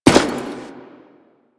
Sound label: Step Default; Warframe; Quests; The New War; Veso